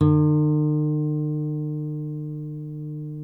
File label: NYLON NO VIB E 3C, Guitars; Spectrasonics; Hans Zimmer Guitars VOL-1